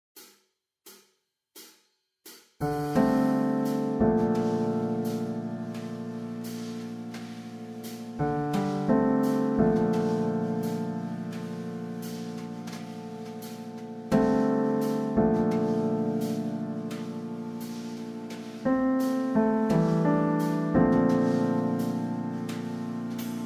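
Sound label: Static Movement - Pattern C - Accompaniment, piano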